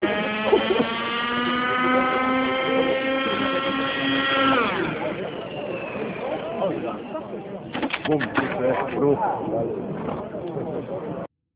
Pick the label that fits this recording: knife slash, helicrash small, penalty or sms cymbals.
helicrash small